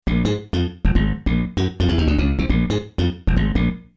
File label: Solo; d-50